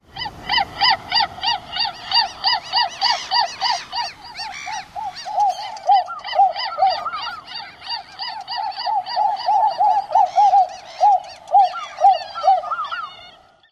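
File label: ROSGUL typical calls, Ross's gull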